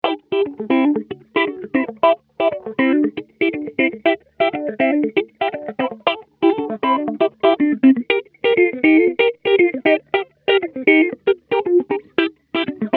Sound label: formant, mobius